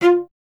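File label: strings violoncello F; Miroslav Vitous; Solo Instruments VOL-1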